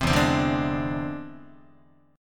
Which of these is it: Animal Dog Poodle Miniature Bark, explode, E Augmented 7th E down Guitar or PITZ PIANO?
E Augmented 7th E down Guitar